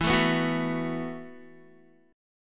E Suspended 2nd Suspended 4th E, Guitar, 4E,4A,5D,5G,5C,6F; strummed; Esus2sus4; 12,12,9,11,9,x